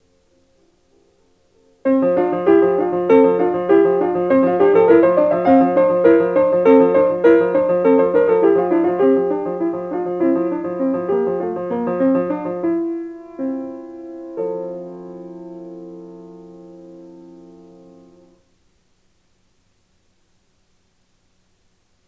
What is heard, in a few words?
Sidewalk Tune